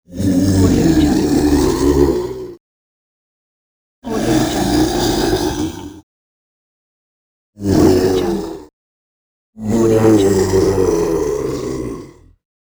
zombie growl

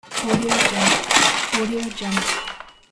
shoveling gravel

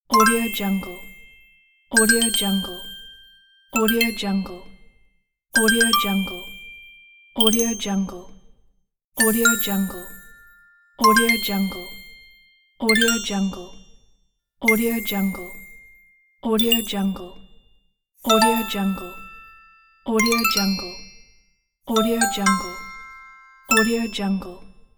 bright game interface and achievement sounds

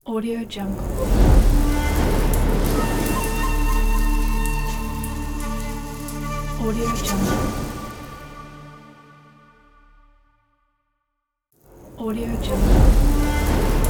fire logo

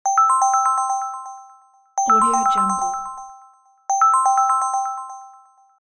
magic bell ringtone

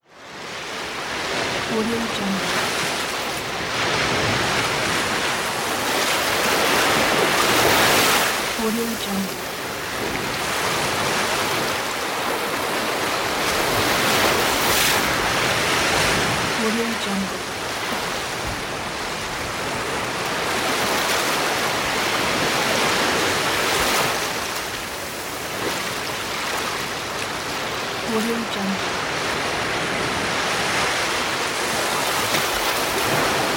ocean waves

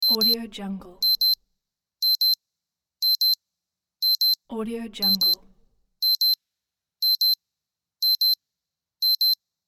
alarm wristwatch

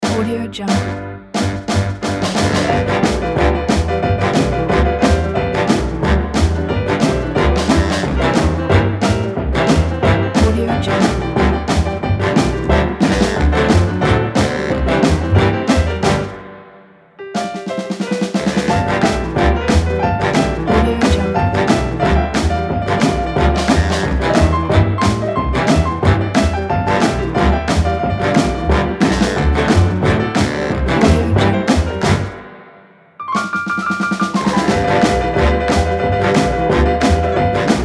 50s early rock and roll

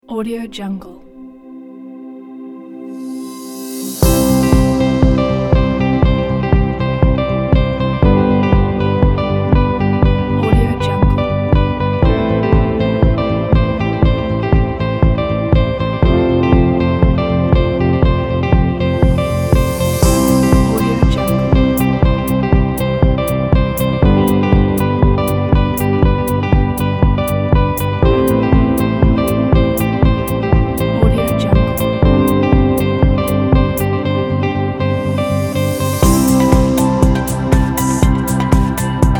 energetic advertising kit

